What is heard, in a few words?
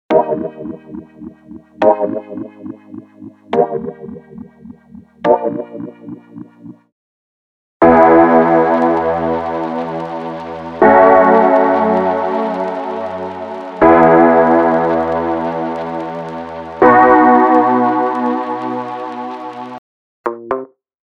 Reggae Full; unisynth